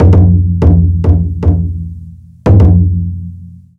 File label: Taiko (Drum); super monkey ball banana blitz